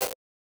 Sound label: Select Glitch; Doki Doki Literature Club